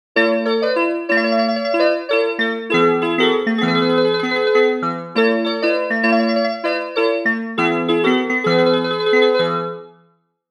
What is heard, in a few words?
Oscillator PERC Mallet Percussion; Alphatron; Syntheway